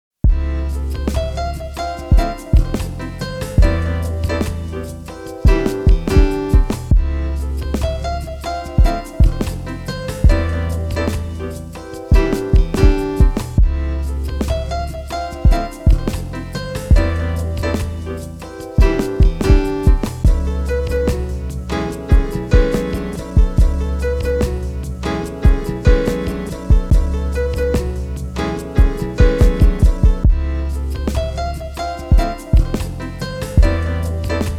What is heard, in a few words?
Beat